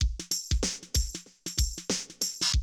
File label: Classic DrumLoops